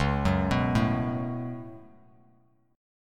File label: C sharp 7th Suspended 4th Sharp 5th, Bass Standard; C#7sus4#5; arpeggiated; JGuitar